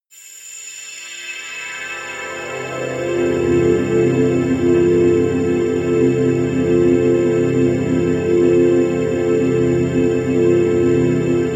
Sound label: space stars, music elements